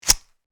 Removing dart from holster

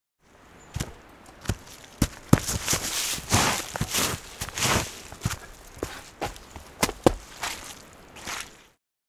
Footsteps walking on a sloping dirt surface

